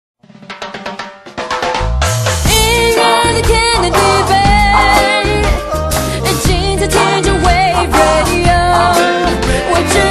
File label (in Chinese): Reggae版